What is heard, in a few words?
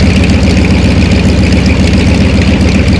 yak rear, engines; War Thunder